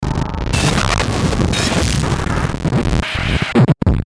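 Glitch Low Slow Warble, Glitch Static Bass Vibrato; Production Element Imaging Element Accent Transition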